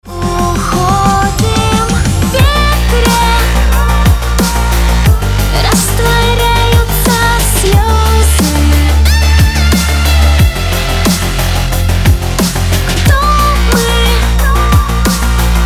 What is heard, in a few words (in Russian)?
elektronnyy